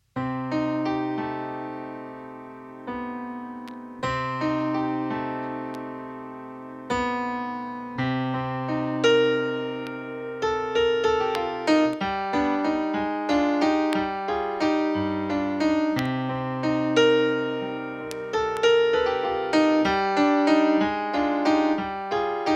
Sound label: blues